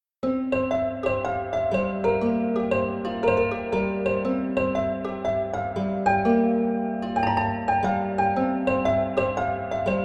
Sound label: feather light caprice